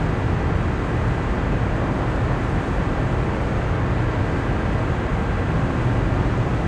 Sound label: office garage tone, ambient tones